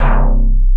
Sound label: BASS L, Drum & Bass 160 BPM; Ueberschall; Drum & Bass Flash Trackz